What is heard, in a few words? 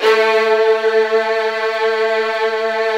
HDS A1, Virtuoso Strings; ILIO; Kirk Hunter Virtuoso Strings VOL-1